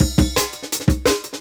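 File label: Jungle Breaks, 170 bpm; Original Jungle Breaks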